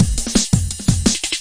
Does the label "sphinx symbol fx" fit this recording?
No